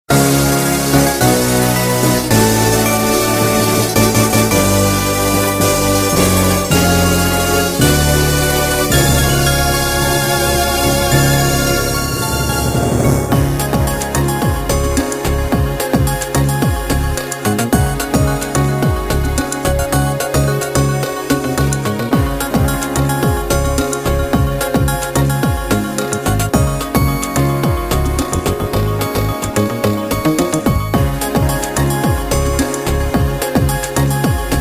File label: Puzzle Fanfare Afterglow; shantae riskys revenge directors cut; ps4; stadia; wii u